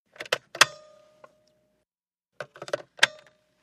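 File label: Car Phone Pick Up Hang Up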